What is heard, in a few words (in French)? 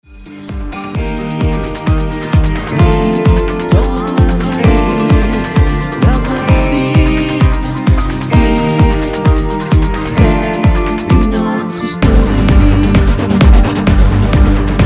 ma story instrumental